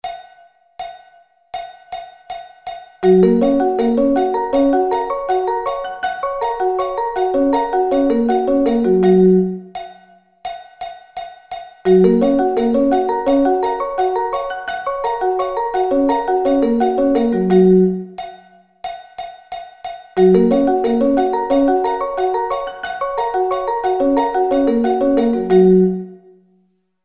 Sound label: Gb Arpeggio Inversions